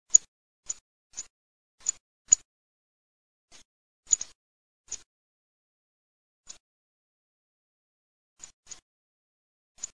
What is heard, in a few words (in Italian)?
TORDO